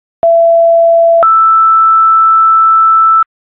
chief, tones

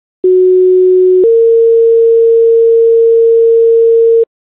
coroner, tones

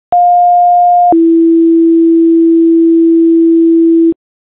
page, tones

